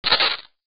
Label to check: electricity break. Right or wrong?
Right